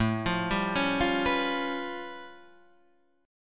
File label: A Augmented 9th A Guitar Half Step Down